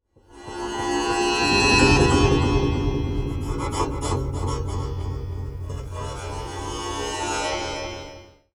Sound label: Bowed & Delayed, contact mic, Wire & Tin; musicradar; contact mic madness